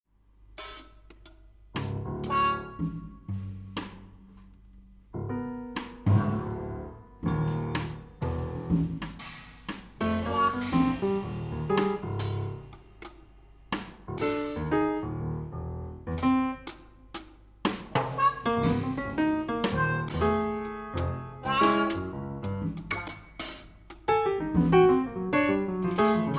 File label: funk